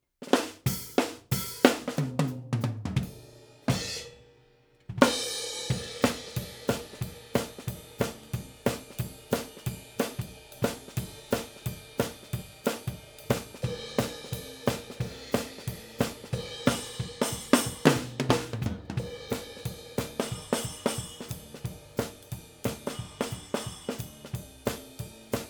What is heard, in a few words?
OHs